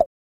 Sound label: pop; Green Chick Jump